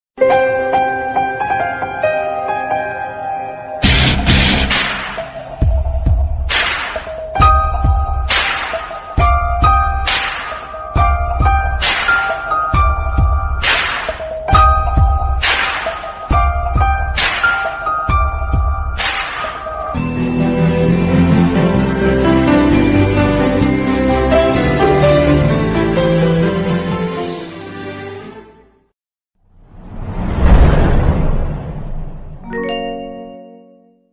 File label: bgm ringtone love ringtone love feel ringtone; sillunu oru kadhal